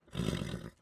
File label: horse grunt